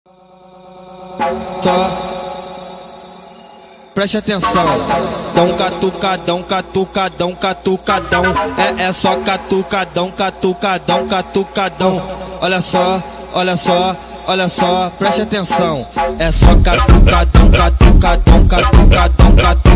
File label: Brazilian Phonk remix